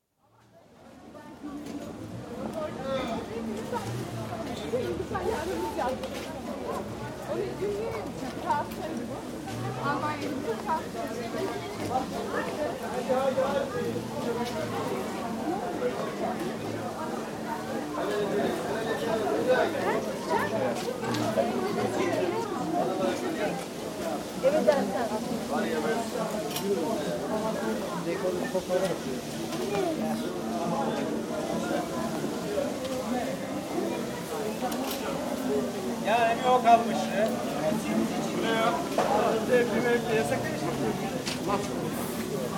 Istanbul, Kadikoy market, with vegetable and meat sellers, fish merchants, and restaurant callers; City; BBC